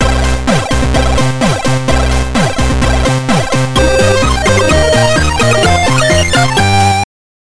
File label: Bomb Order Bonus; bomb jack gameboy